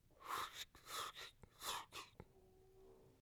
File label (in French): distributeur de gel hydro; Liquide; Gel Hydroalcoolique